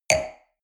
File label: wine glass place table medium short; berufe; soundpool